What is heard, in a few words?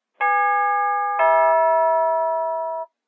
ding dong, door chimes